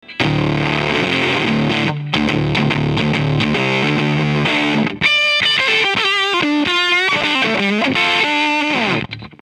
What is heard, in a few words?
amplitube fuzz face